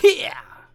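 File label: Mon Man ATTACK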